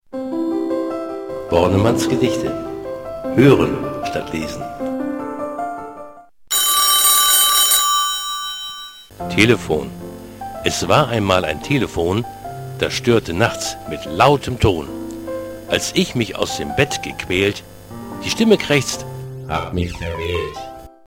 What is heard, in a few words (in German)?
telefon